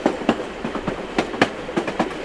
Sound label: unit cart move loop; Fieldrunners